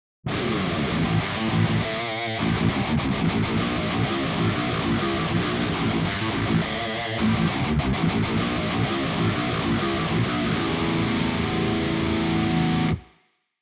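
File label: guitar 7st, 7-string guitar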